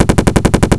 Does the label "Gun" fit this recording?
Yes